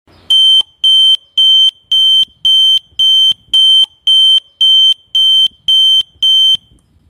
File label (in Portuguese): som sirene detector disparo